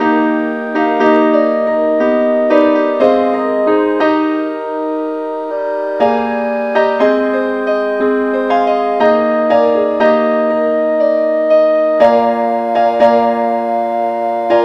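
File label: keyboard key A flat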